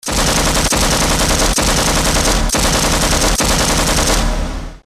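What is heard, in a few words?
machine gun burst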